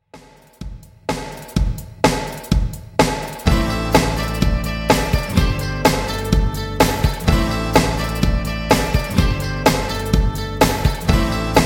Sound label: Intro Outro Clean 126 Bpm